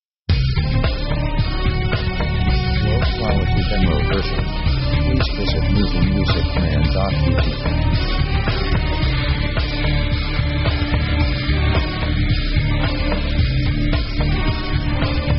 pop dark gothic

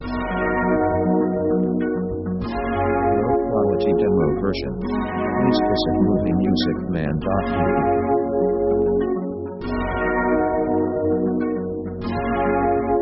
synth late70s

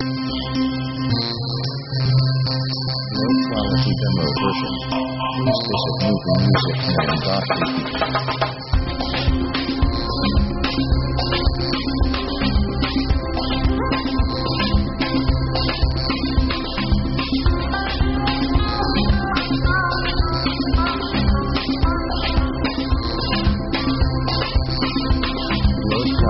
oriental pop

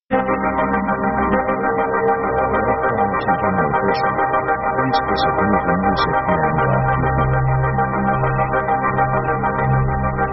flowing; contemplative themes

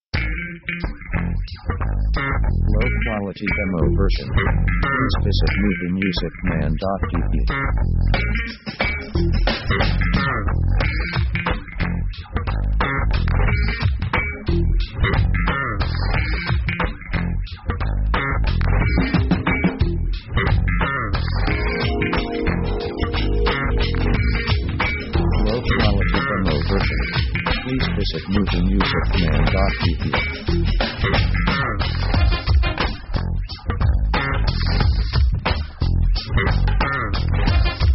hip hop cool fusion; Background themes

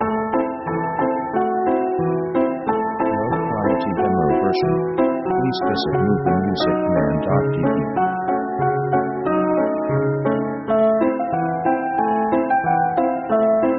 piano waddling; orchestra themes

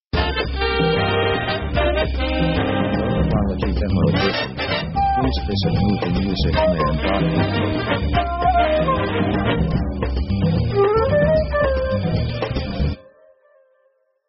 jazz cool drive brass

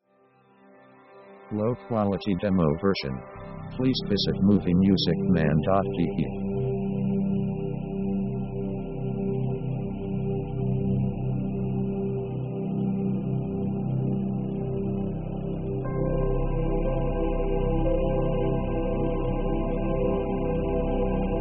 cinematic thriller

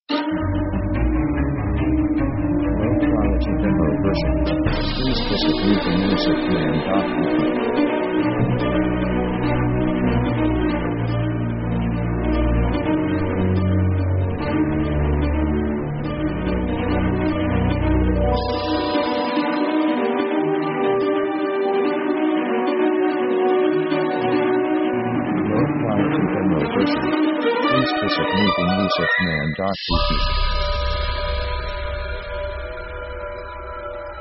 orc progressing adventure; Action themes

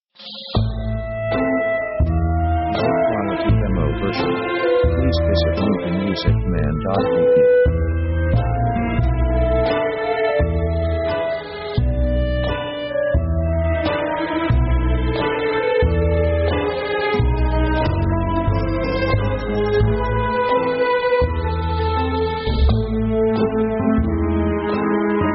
russian rhapsody, Action

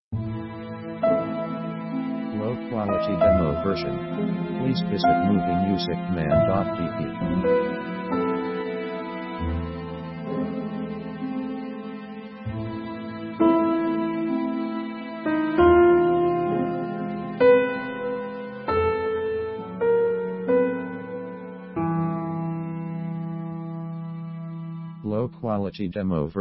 slow walz

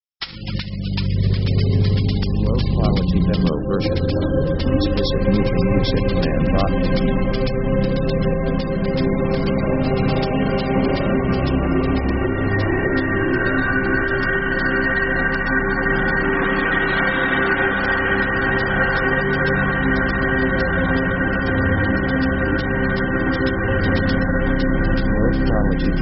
dark; synth themes

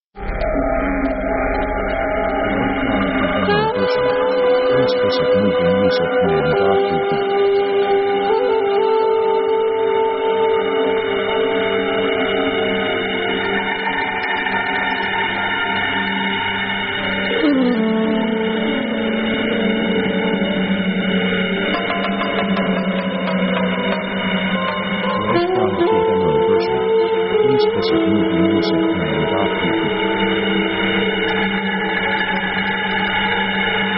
mystic saxophone; synth themes

